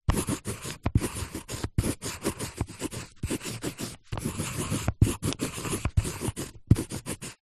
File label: office pencil artists thick writing on paper